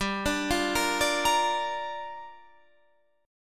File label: A sharp 6th, chord